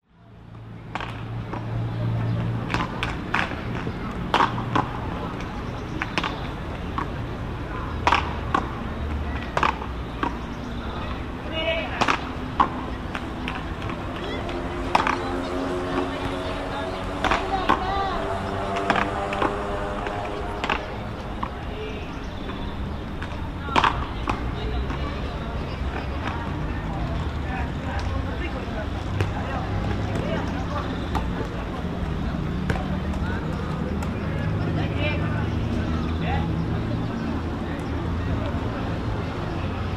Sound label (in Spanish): plazas adolescentes jugando pelota paleta; 4 plazas